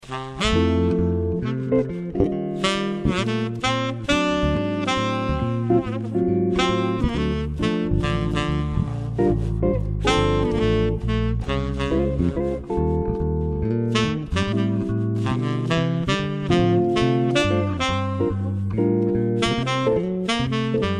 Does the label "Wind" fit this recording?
No